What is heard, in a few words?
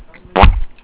noise